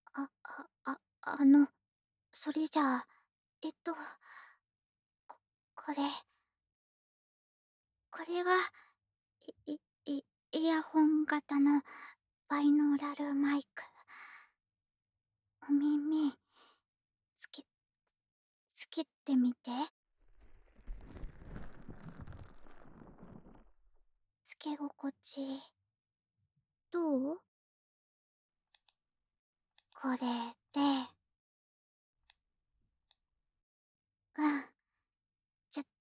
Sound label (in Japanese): 耳舐め -EAR LICKIG-; 職場にて・２; 収益化記念・耳舐め配信